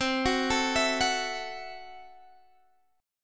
C 6th Flat 5th, C

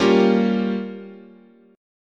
G Minor 7th Flat 5th, guitar chord

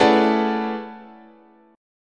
A Major 13th, guitar chord

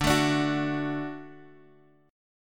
D Major, D

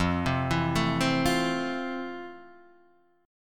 F Major 7th F

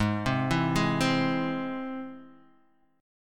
G# Augmented, guitar

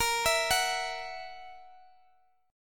F sharp 7th, A sharp

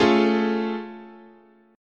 A Augmented Major 7th, chord, guitar

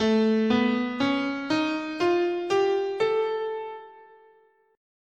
A Whole Tone

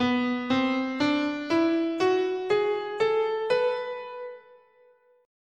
B Phrygian sharp6

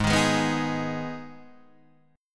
Ab Minor, chord

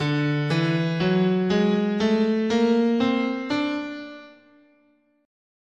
D Lydian Minor, guitar, scale

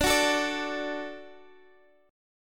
D-Major, D, Guitar, Open D

